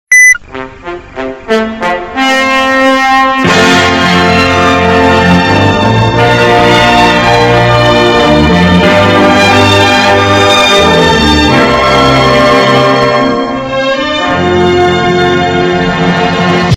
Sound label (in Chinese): 手机铃声; 新闻联播